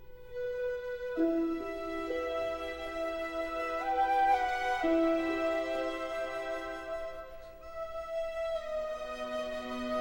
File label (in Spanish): musiquita